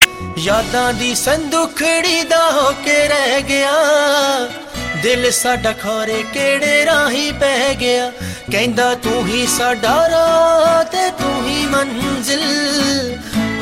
new punjabi song; Indian

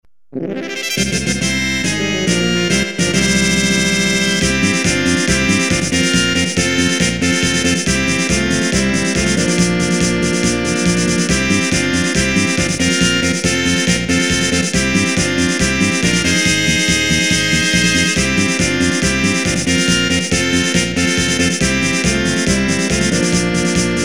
victory fanfare; Final Fantasy II

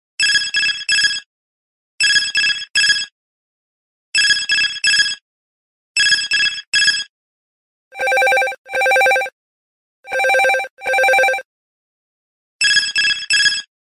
Samsung Galaxy S4 3D Cell

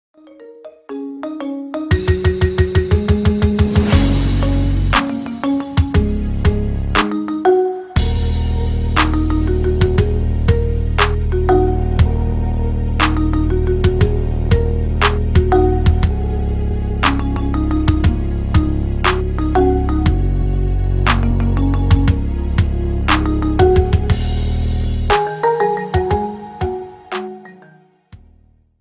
marimba ringtone remix

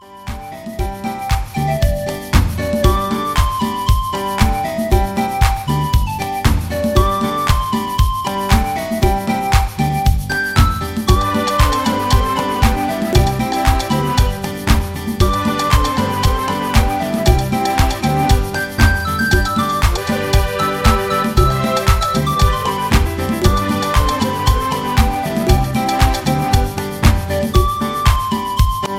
tropical retro sound

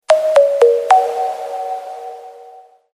cool tone

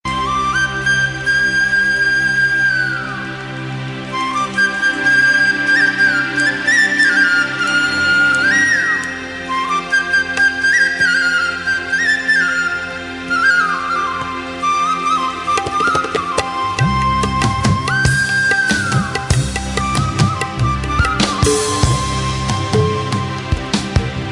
bansuri instrumental; Indian

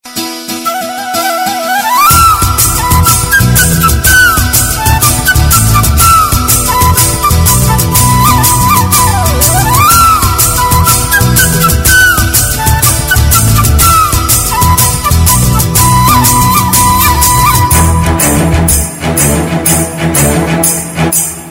flute; Indian

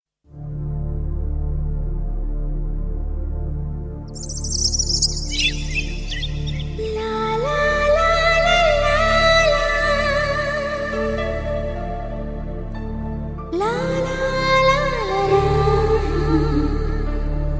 humming melody; Indian

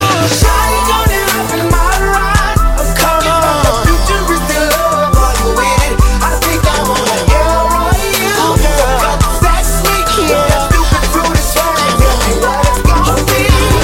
futuristic love tone

